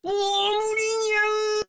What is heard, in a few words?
die; votemap; kani